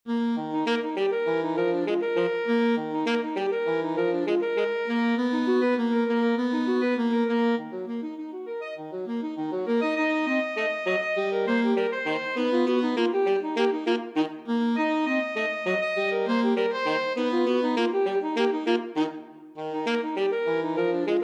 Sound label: rag, alto saxophone duet